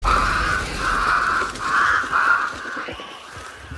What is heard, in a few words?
crows; hunt showdown